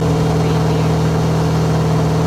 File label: Chevy V8 Hot Rod Engine Loop